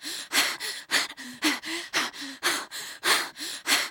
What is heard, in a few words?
female out of breath; Project Zomboid v41.73